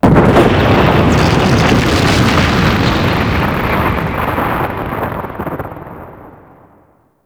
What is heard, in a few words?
Rock Sucker A, exterior ambience; Warframe; RailJack; Pluto Charon Region